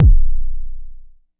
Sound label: Jomox MBase, drum machine; Jomox MBase 01